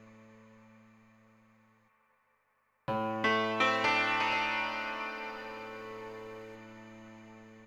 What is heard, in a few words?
ethno, 125, A